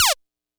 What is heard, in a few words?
kit, Yamaha Motif-ES8